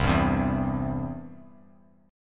C Major; strummed; JGuitar

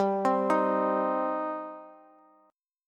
G Suspended 4th Sharp 5th, Bass; arpeggiated

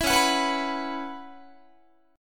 C sharp Minor 11th, Guitar; C#m11 strummed

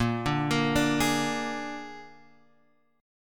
Bb 7th, Guitar, Open G

